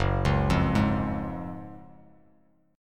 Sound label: Gb Major 7th Suspended 2nd, down, Bass Standard; arpeggiated; JGuitar